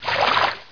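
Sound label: water wade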